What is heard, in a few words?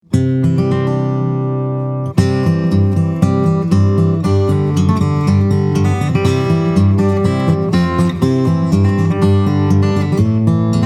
Oh Come All Ye Faithful, guitars